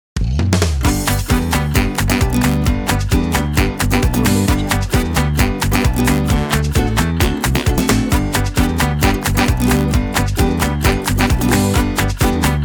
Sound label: happy children game; audiomechanica